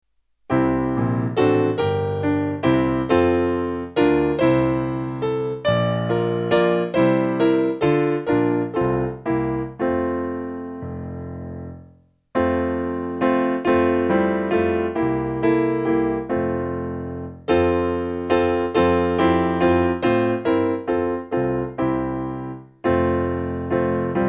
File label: piano; Santo Dios Te Damos Loor